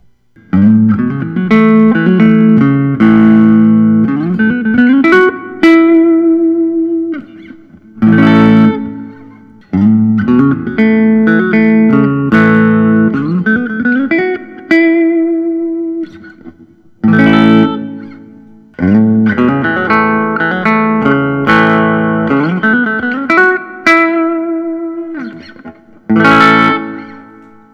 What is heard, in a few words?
gibson custom 59 lp reissue aged honey tea